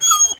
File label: wolf hurt; mobs; Minetest; VoxeLibre